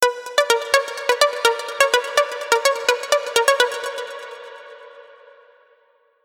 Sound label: alarm; Paratamatu